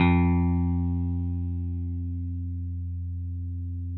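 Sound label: 6 string bass; Sampleheads; Whole Lotta Country 1 & 2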